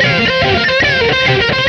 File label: guit lead; Sonic Foundry (Sony Creative Software); Ugly Remnants VOL-2; Leads